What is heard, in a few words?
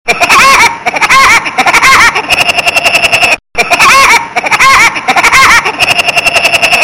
Wood Picker